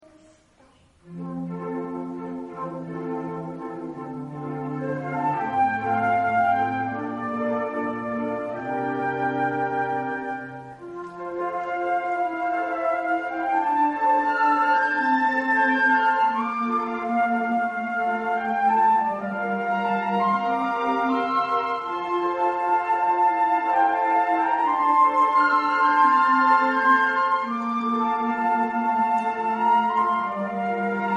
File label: concert, flute; autumn concert 2019